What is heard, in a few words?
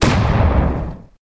punch; Asura